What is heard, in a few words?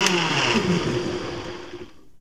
BLENDER OF, Kitchen Counter; SFX; E-MU Producer Series Vol. 2; More Studio Essentials